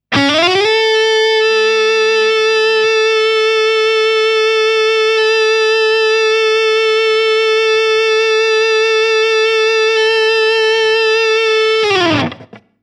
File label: Schecter Sustainiac